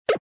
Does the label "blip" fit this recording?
Yes